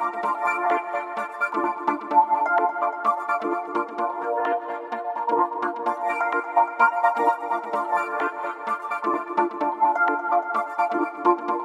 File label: VFX2 Loop 128 BPM